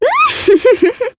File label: giggle; neopolitan